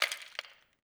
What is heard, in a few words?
office occasional